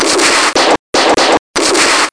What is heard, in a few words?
gunfire